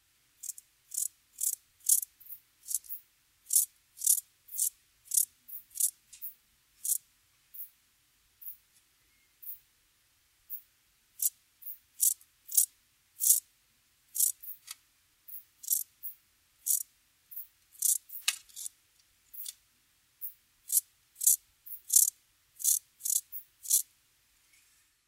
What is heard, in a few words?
rufous grasshopper